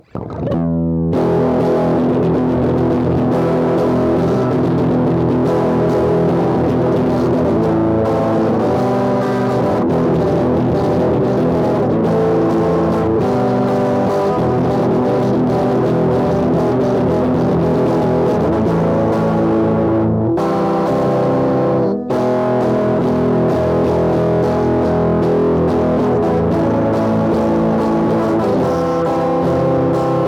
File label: electric